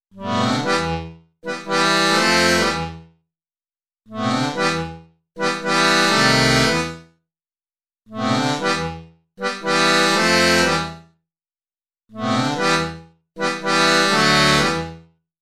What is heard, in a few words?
Accordian Verb